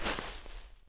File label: Foot Snow; Civilization4